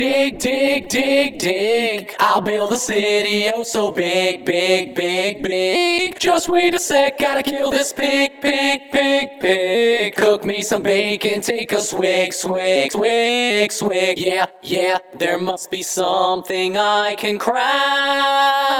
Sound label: Vocals